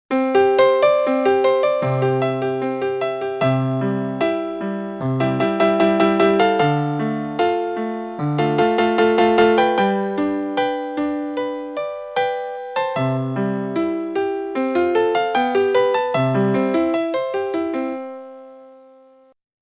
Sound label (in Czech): melodie